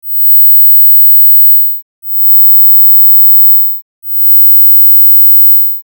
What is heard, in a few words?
secret ringtone 6khz